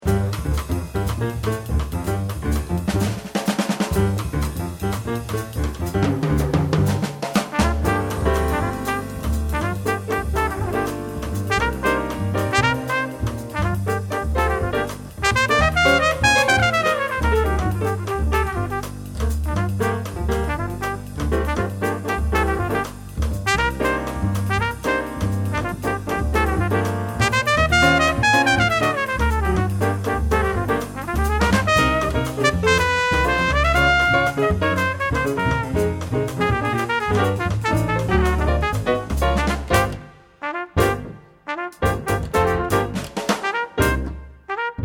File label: Salsa